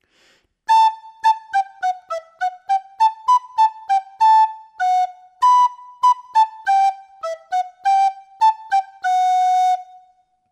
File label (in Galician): stacatto, Pito Galego; articulacion